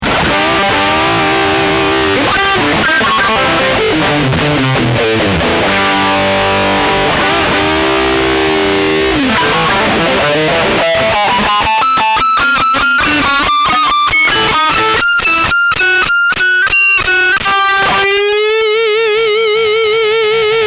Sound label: Pedaltone Boost